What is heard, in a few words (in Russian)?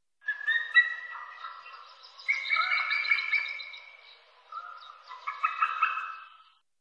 orlan belohvost golos